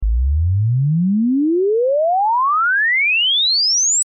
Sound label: Sine 50-8000 hz 0dB sine